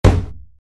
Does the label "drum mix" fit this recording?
No